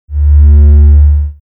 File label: mothership loop; Garry's Mod; tf2; mvm